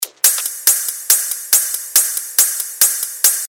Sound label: 140 BPM, techno loops; Genotype; Revealed Techno Top Loops Vol. 9